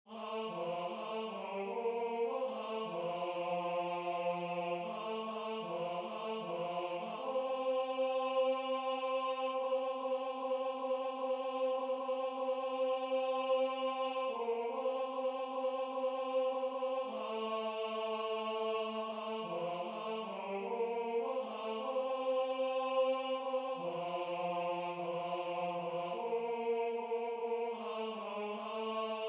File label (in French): LA VALENTINA Hommes